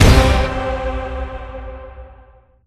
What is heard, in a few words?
Fuse Levelup; Spider-Man Unlimited gamerip 2014 android ios windows